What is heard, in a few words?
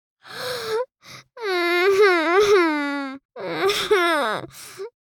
Little girl crying